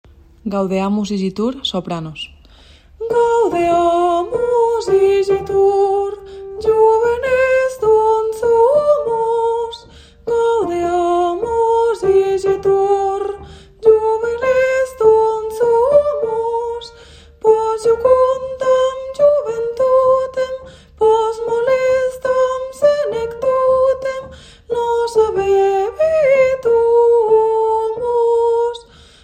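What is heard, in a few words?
soprano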